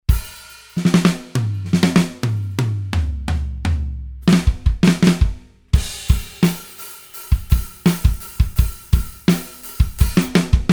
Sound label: bigbeat rock